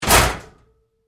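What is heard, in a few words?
Door Locker Close Close Up Medium, Metal Door; Doors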